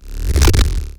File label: Gurgle Bass Rush; Cwejman S1; 300 Drum Machines; DevSnd Cwejman S1 - JK